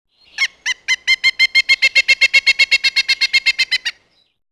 birds; leisure suit larry magna cum laude

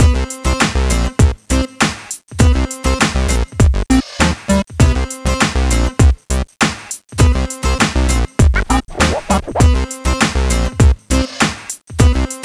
Hiphop Boucle; Imagine Modern Dancer; Nintendo DS

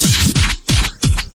Fill 176BPM; Friday Night Funkin; nasadotexe

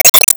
Enemy Water plant Tentacle Shrink; Se Enemy Waterplant Tentacle Shrink

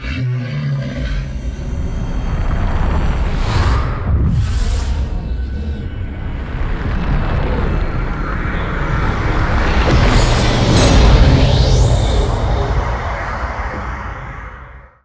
Win; Hakai

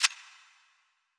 Scroll; D4DJ Groovy Mix; Android, iOS, Mobile

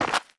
Foot Come

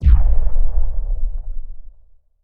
Miss

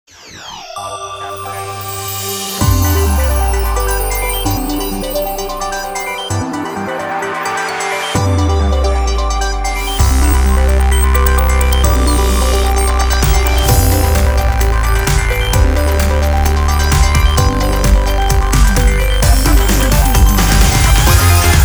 (off vocal); PC game Parasol

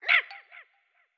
Skeleton Aggro

